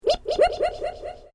Created Creature Small Ping, Alien Sound Effects

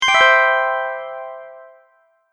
Close Application, beep

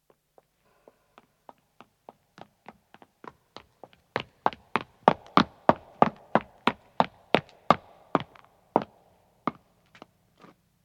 Footstep stone run, 1 man approaches and stops; BBC

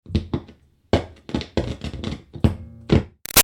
Static Guitar Plug In-Out; Interference; Buzzes; Guitars; Musical

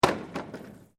METAL SHEET MOVEMENT

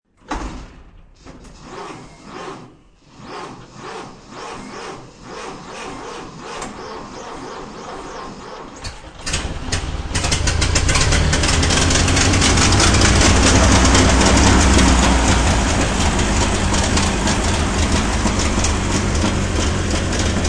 Model T Starts, 4 Cylinder turns over and idles; 1931 Ford - 4 Cylinder turns over and idles; Cars

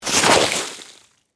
Snow Ice Scrape, Weather, Snow, Ice, Scrape; BlastWave

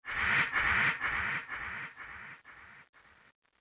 Horror Ghastly Breath; Ghost Sound Effects

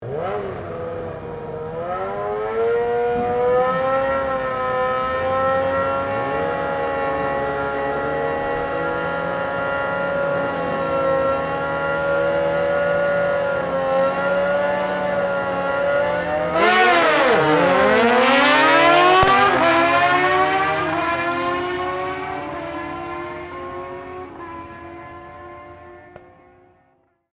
Motorcycle Drag; Sports, Motorcycle, Rev, Dray, Away, Two, Bikes; Motorcycle Sound Effects; BlastWave

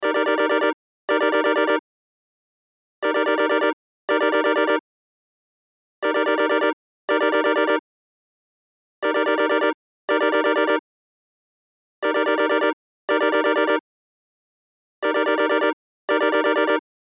Cell Ringtone; Loop; Cell Phones; Blastwave

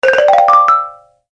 Deep Bonus Win, Video Game Sound Effects

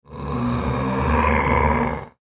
Horror Monster Frankenstein Growl Creature; Monsters

